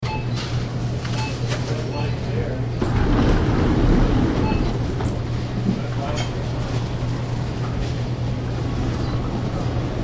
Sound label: atmo arizona sedona grocery; bashas